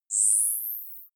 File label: zoom out, signale